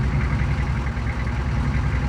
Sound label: Idle Engine, car